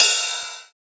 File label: Ride; FLPRO